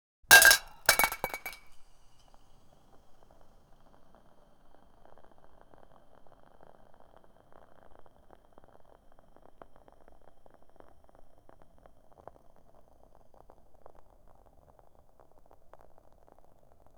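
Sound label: gasoline pouring from metal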